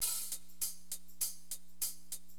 Drums, AcidJazz Funk; hiphop; LiveAct Pool 1

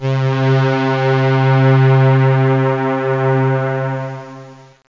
jx strings; Zoom - Release 2; Active Software

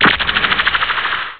Radioactive molecule; Chiral 1.0.1

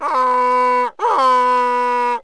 donkey; Wired for Sound Pro CD; Aristosoft, Inc.; animals

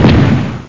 explosion

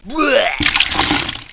puke; Hellboy; CD Expert nº 41

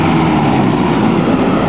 Bus; Knockoff

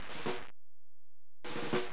drumroll; 12000 Graphics Pack - A Complete Graphics Collection For Your PC

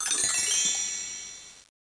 clic; Rainbow

